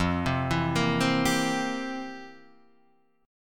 F Major 7th Suspended 2nd Suspended 4th Guitar Half Step Down